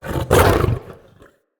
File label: Wolf Attack Growl; Animals